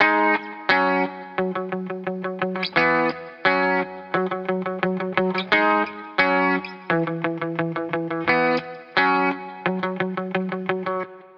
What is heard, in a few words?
Riff 174bpm Fmaj